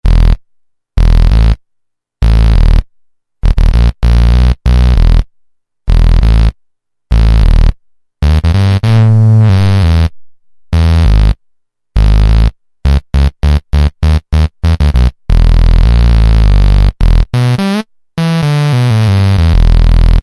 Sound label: Bass; Ba-Snoop Bass; Yamaha EX5R